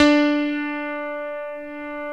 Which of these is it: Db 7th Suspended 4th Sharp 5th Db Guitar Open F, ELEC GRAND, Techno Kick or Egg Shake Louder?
ELEC GRAND